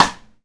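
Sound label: snare cross stick, EMU PX-7 drum machine; 300 Drum Machines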